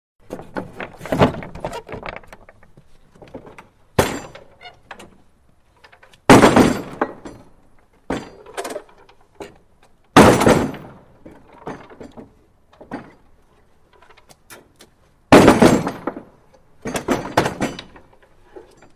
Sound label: Barrels Thrown Onto Wagon; Wagon's Front Panel Slides Out - Heavy Barrels Then Thrown Onto Wagon. Good Chain Rattles On Impacts.